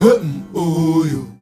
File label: MLEGRPCH, Heart of Africa, CHOIRS; Spectrasonics; Heart of Africa 2